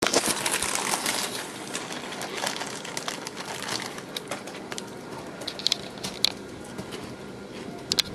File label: Failed